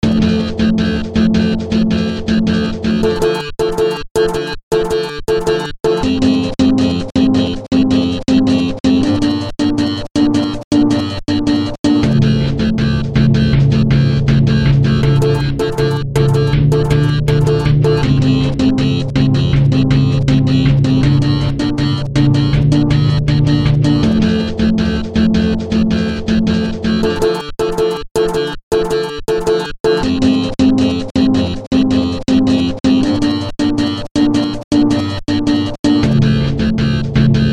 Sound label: Medium Alert